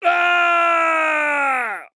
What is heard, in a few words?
fall scream; mk9 liu kang